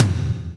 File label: TOM TOM, Roland